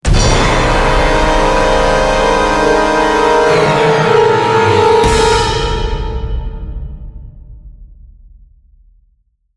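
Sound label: Sting Large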